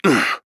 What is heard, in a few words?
Grunt Jump; Paladins